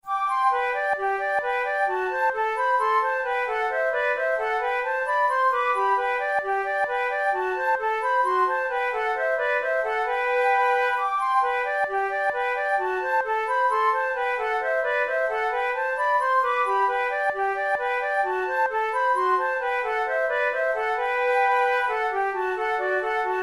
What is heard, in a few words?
waltz duet, flute; New York Serenading Waltz